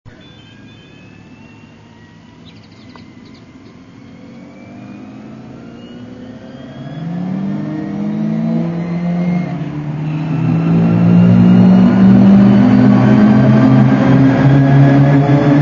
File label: mini tunnel; magicspanner